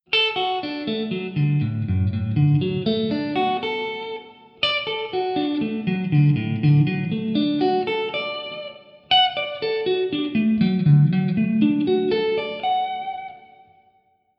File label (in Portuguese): Montagem de Acordes Arpejo Triade Maior